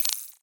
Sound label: Ui Click Menu Modern Interface Select Small